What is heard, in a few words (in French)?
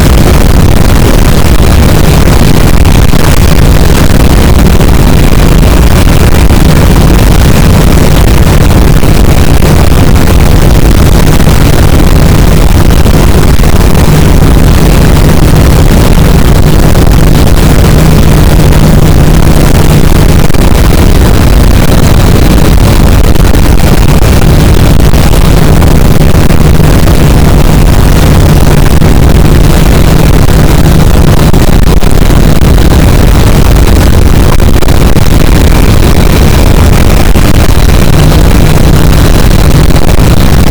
cachalot positif, boat; Physeter Macrocephalus